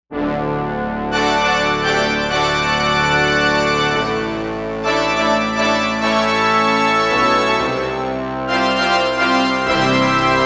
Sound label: BIG BRASS